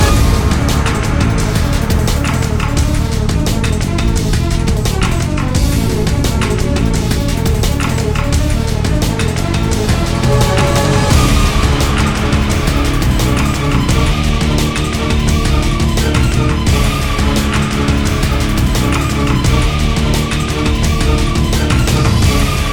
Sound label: banshee fight loop; garrysmod